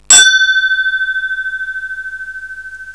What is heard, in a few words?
gong